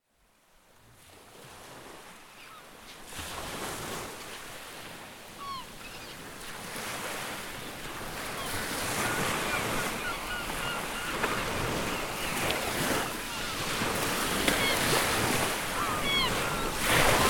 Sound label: ocean waves; gtech